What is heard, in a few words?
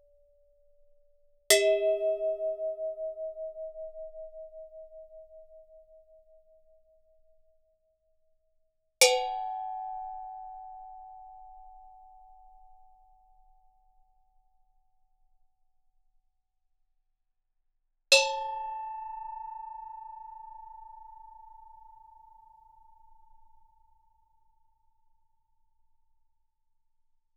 Gamelan; Propeller Island - Complete Gamelan